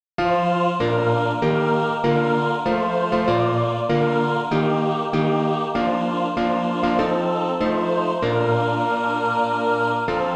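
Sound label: mixed, Practise Music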